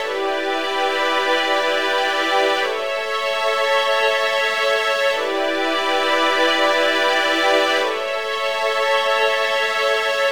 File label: strings, analog funk